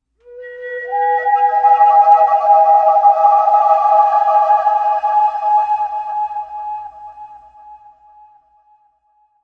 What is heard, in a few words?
verby bamboo flute; Flutes